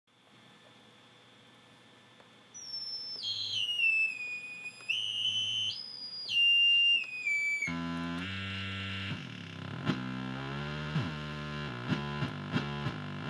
Yamaha MT120S mixer noise

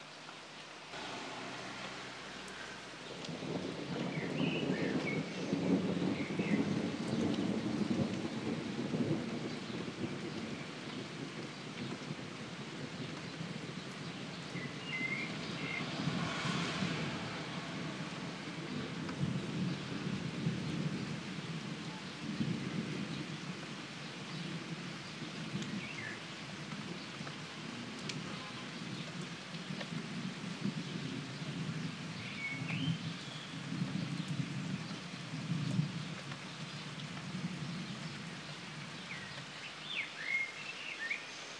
thunder in rawmarsh

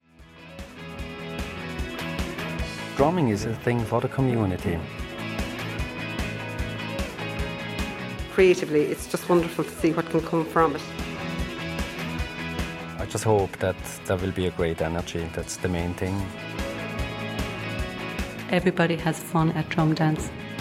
drum dance; ireland promo; grassroots

